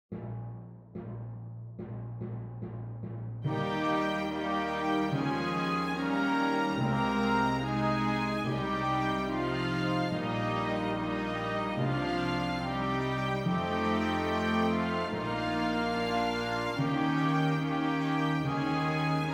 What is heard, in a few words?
Ode to Joy Full Band